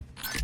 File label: money change